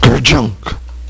kerchunk; HyperHangMan

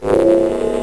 zapish

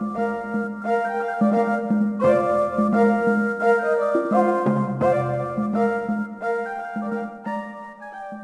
city jig; DAoC

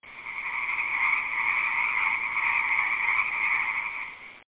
Night Frogs; HyperStudio; HS Sounds

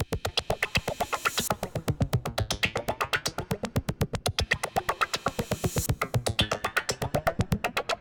Techno Jazz - basic, Hybrid Drum Loops; Cyclotronic Resonator

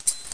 grenade pullout; Soldat

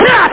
kiaaaaa!

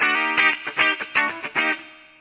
funk bit

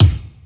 BASSDRUMS; DRUM SOUNDS

